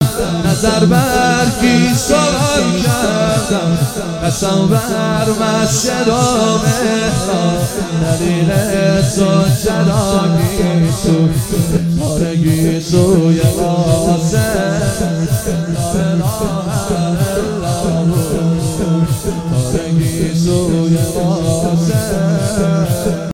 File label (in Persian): مداحی